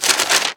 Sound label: paper grab; Call of Duty Modern Warfare